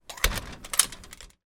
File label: household door security grill close; zapsplat